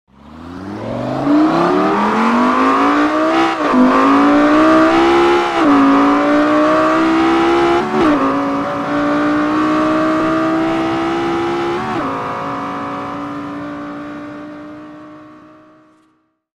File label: lexus lfa sound